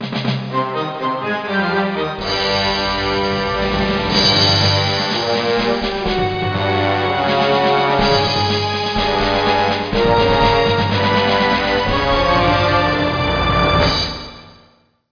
Gunfight; Indiana Jones and the Infernal Machine

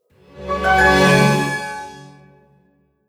Level Up; lost sphear

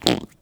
Fart; lego ninjago rise of the snakes

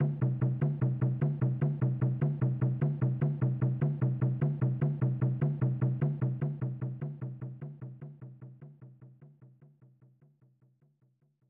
Jungle Rhythm; Pitfall The Mayan Adventure; SNES

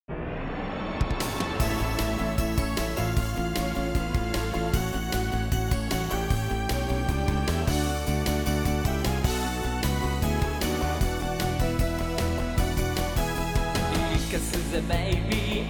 Ending (vocals); super air zonk; pc engine cd

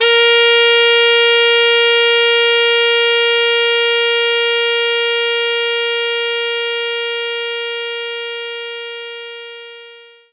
Baritone Sax D3

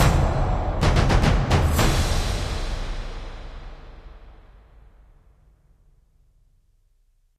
Victory Stinger; runic rampage

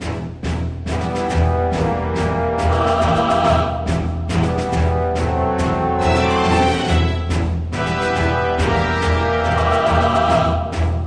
Music Minigame; Pdex

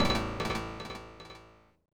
Curtain Fall (Game Over); Samurai Shodown; Samurai Spirits; Genesis

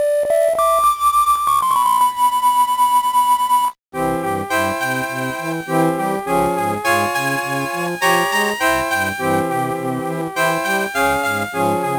Magic Whistle; Warp World; Super Mario Advance 4; Super Mario Brothers 3

